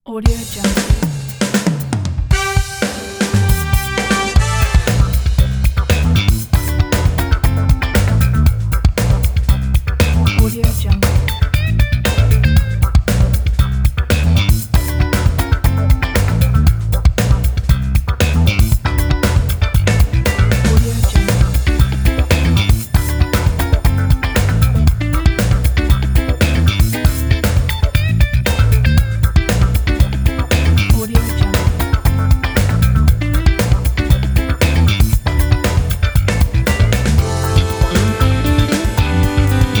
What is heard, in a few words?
retro funk groove; blackbirdsound